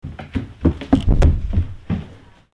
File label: footstep; CLANNAD FULL VOICE; Key